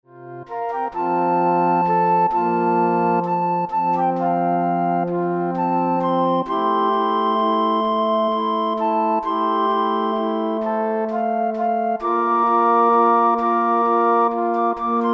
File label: Flute Ensemble